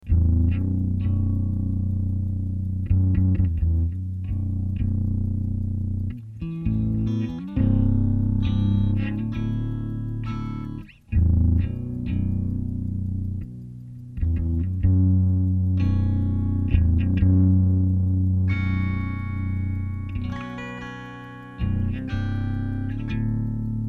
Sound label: zipper loop